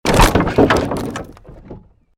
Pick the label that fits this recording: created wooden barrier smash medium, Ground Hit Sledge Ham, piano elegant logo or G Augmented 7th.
created wooden barrier smash medium